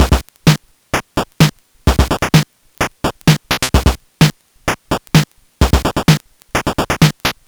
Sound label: Game Boy Break Loop, 8-Bit, Drums